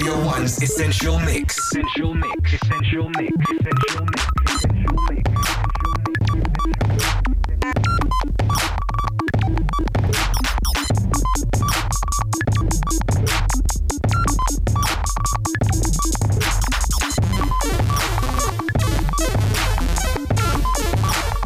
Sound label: instrumental